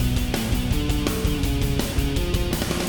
Boss Fight Phase 2 MIXED; Ben 10; Weatherhead Prime

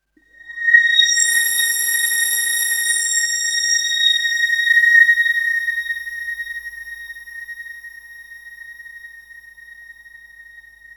Oneshot Synth

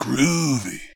Meow; Kwix

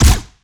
Lasrgun Guardian Pistol Fire

